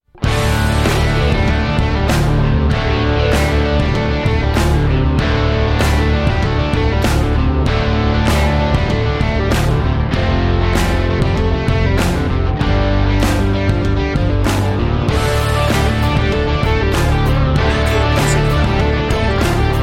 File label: texas rocker